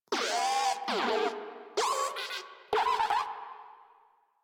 Moa Compassionate Vocal Player Down; Warframe; Pets

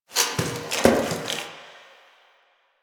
Box Of Trash Rustle

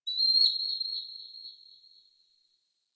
Forest Bird High; Warframe; Grineer Forest

